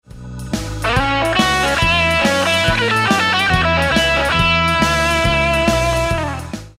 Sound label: Lick, guitar